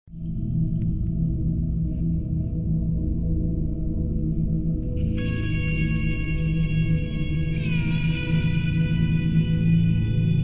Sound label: menu; Bubble Tanks 3